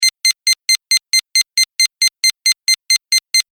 Beeps Data Transmission Technology Scifi Countdown Medium; Beeps (Sound Effects:Multimedia)